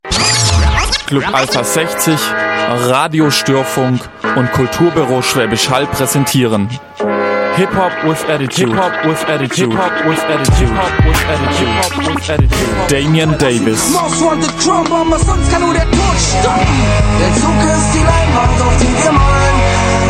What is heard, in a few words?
jingle hip hop with attitude